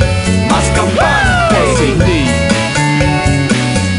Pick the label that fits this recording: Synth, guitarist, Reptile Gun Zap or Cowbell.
Cowbell